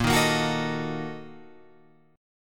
Bb-Major 7th Suspended 4th Sharp 5th, Guitar, Open F; strummed; JGuitar